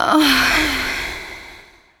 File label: female orgasm